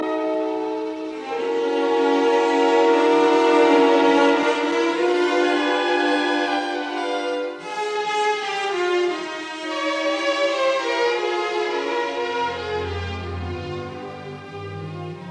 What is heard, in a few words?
Symphony in C minor, Allegro moderato; Symphony No 8